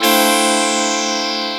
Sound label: Quadra Pad (Minor)